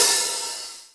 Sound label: HH, DRUM KITS; InVision Interactive; 40 Oz Phat Slammin Hip Hop